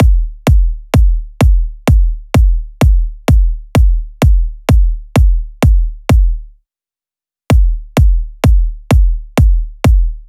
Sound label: Drum Loop BD; 128BPM; Loop Elements; VEC5; Drumloops; Multi Loops